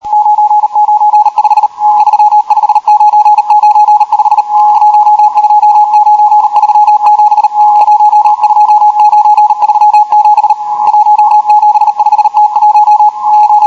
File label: morse; Schneller Morse Zahlen-Sender (unbekannte Herkunft); radio tv historisch